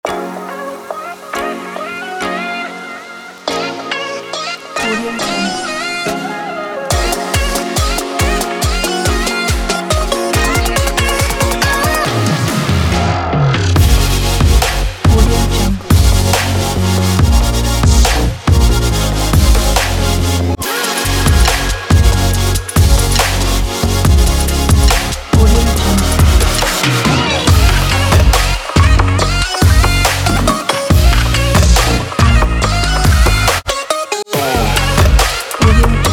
future bass

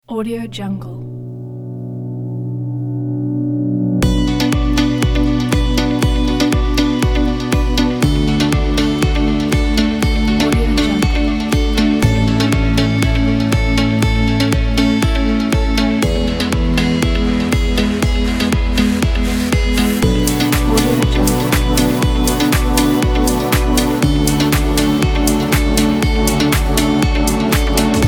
electronic inspiration kit

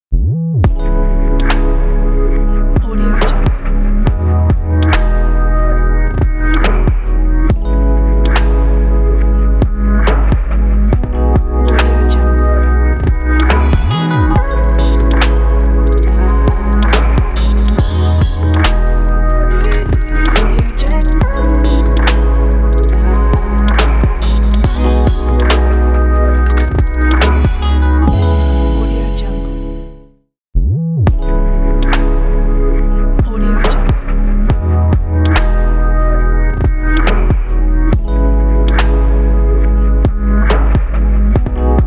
fashion chill luxury logo

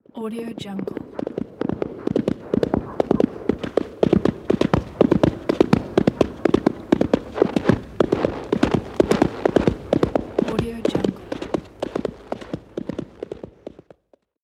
horse galloping on the snow

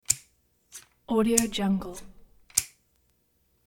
hedge clipper cutting slice clicks; hollywood edge

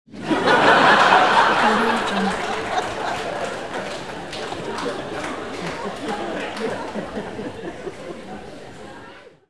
crowd laugh

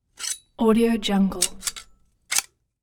metal hand cuff

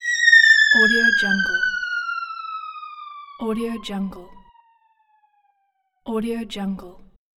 fail descend

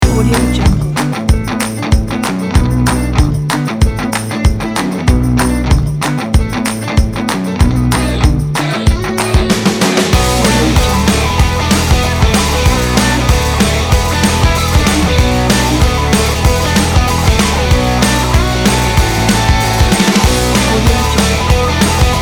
indie upbeat

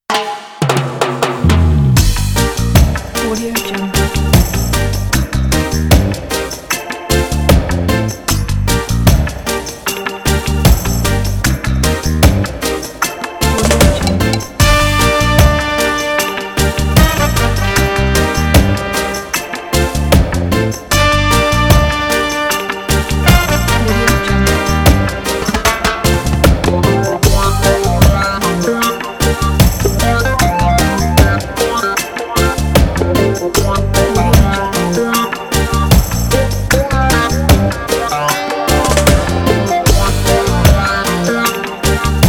reggae kit

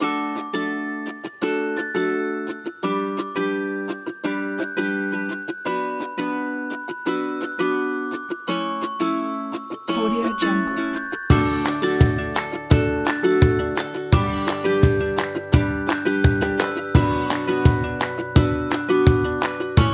happy upbeat ukulele children

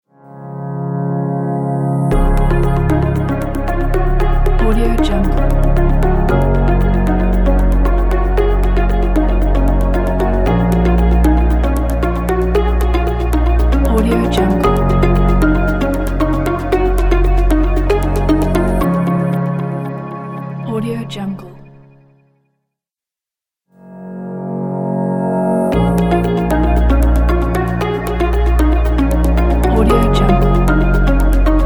intro corporate logo pack

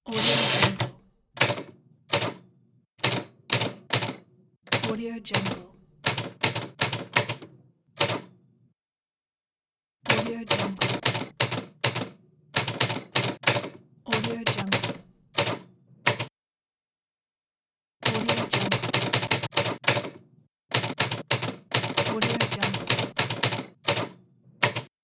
typewriter

